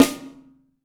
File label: Snare, Roland TD-20